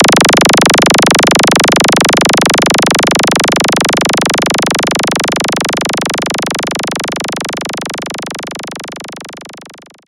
Filter Snap

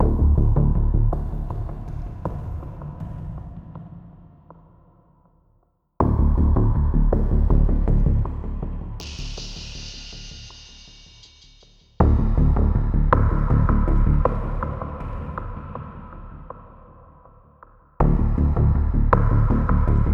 Suspense Tension Buildup; Clone Drone in the Danger Zone

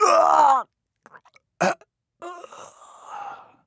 Phoenix Death; Phoenix Coop T Death

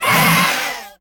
Attack

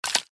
Click; Red Ball 4 Vol 1

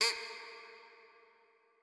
Duck; miside demo windows gamerip 2023

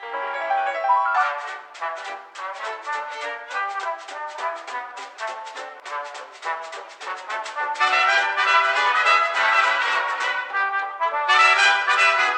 Menu; Five Nights at Candys

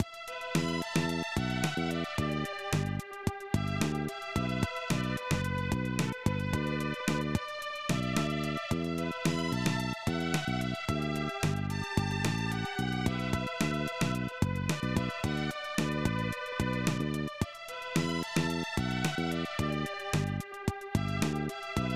Challenge Game Fail; pro mahjong kiwame 2; snes

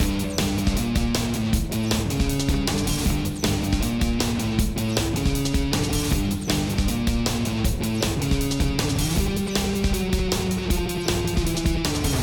Tornado Combat MIXED; Ben 10

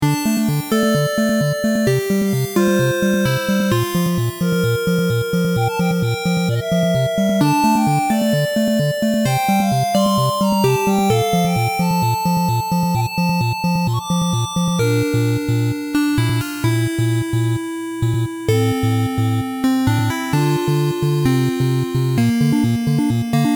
bgm dungeon; Toolkit of the Kingdom; Asobox; android game music

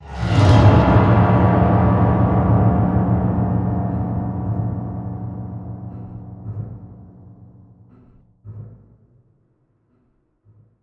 STRING PIANO MESS; transformers war for cybertron gamerip; TRYPTICON STATION

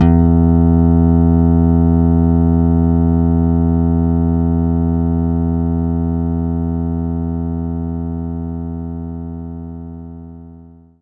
Slap Bass E3; knights try

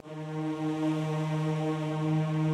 sounds music; Halo Combat Evolved; Xbox

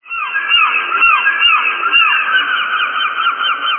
Gulls; Buggy; PS1

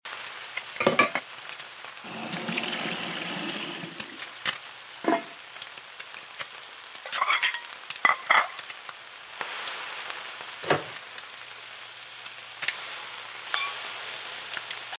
Amb Cooking; City

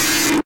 Snd glitch; Knuckle Sandwich

